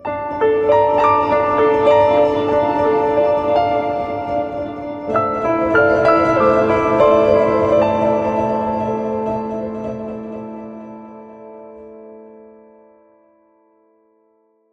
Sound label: music logo theme